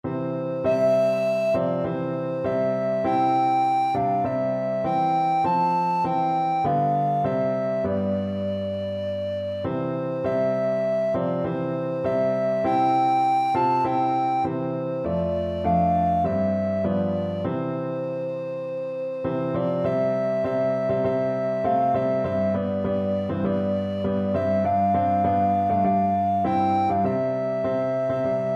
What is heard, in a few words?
at the cross, alto recorder